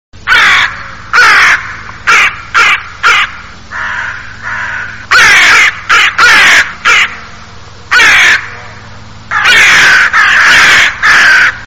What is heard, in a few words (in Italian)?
cornacchia